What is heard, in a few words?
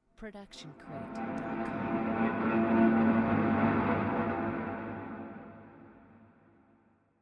scifi doppler